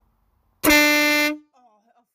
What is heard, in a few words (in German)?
Drucklufthorn Stebel Nautilus Truck 24V